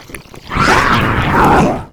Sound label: imp sight, monsters; Doom